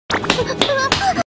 girl cri

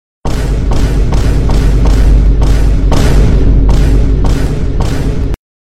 vine boom back to back

